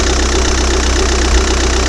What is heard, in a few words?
skoda octavia, Vehicles